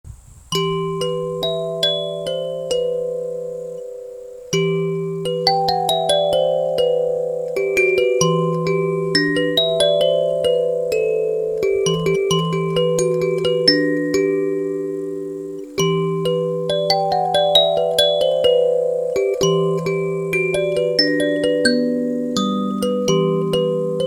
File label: 17 tone Eminor 2rows kalimba; Maitreya; BOLFkalimbas